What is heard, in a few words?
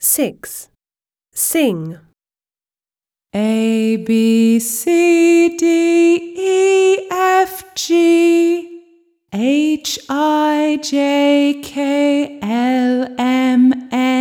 sing ABCDEFGHIJKLMNOPQRSTU; British; My alphabet book; Smart Book 1